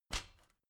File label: step ladder metal hard single; schritte